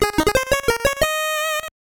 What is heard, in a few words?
Level Up; Honoo no Toukyuuji Dodge Danpei 2